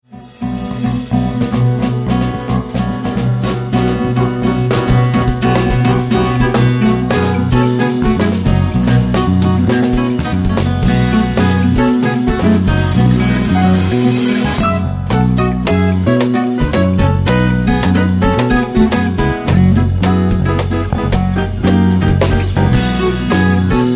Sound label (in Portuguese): Viola